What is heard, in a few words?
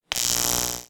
Electric Shock Hit